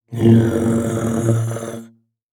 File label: Human Growl Through Tube